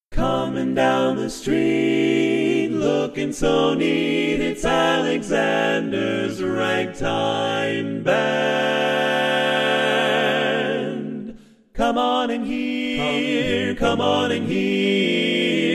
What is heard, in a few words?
Ragtime Band, vocal harmonies